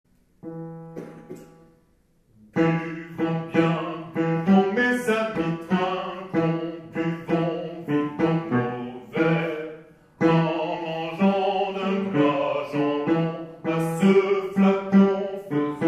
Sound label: Basses; Tourdion